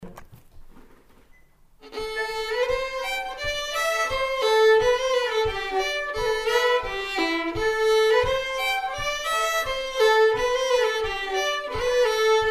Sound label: Polka, fiddle; Tolka Polka